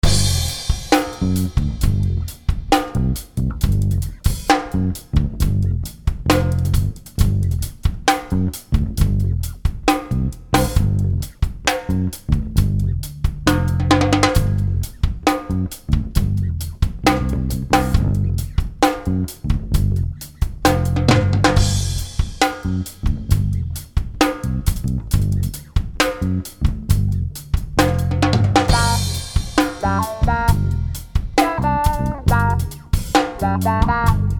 Beat Loop Relaxed Erotic Funky Drum Slide Bass Wah Guitar; Miscellaneous Loops; Blastwave